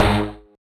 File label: marimba steeldrum; ゆめ2っき